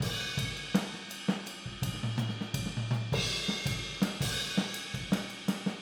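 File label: Boogaloo Fill Overhead stereo, 82 BPM; Sonic Foundry; Drums from the Big Room The Mixes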